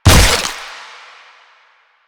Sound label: Infested Ancient Footstep; Enemies; Proto Infested Ancient; Warframe; Ancient Ninety Nine